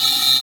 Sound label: RAVE, RAVESYN